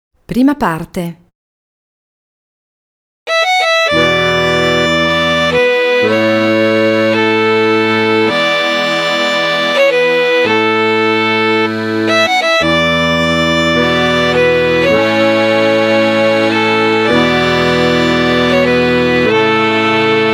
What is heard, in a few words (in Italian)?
Pizzica ritmo; traccia ritmica